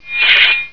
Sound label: SLICE